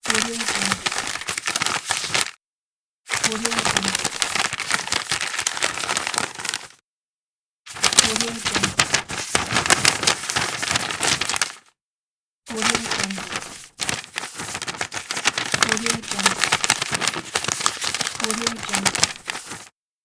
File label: paper crumpled; blackdragonfx